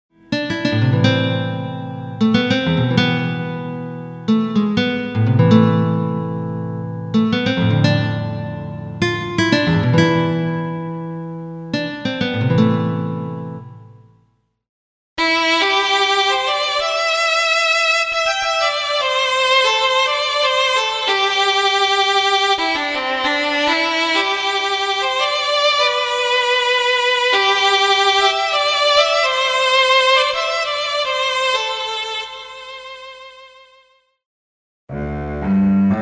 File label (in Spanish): guitarra, violin violonchelo con pastillas